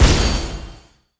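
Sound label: fort attack explosion; monster; war3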